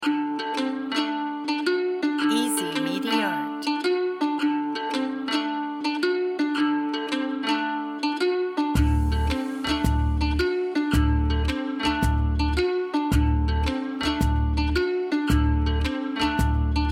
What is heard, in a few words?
caravan